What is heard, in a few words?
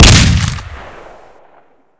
saiga shoot